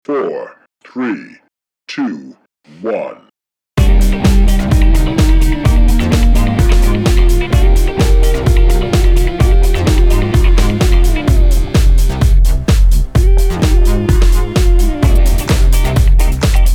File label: music